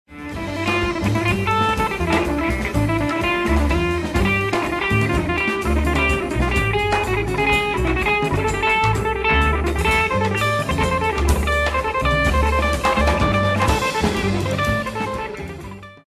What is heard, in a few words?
strike solo